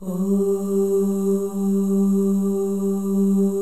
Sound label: FEM OHS, Symphony of Voices; Spectrasonics